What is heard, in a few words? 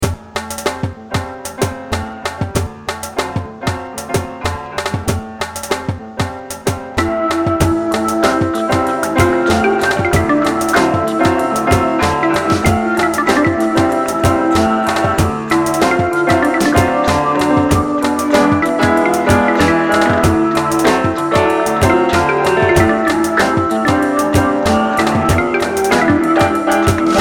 Blues base; kvr